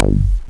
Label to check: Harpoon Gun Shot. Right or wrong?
Wrong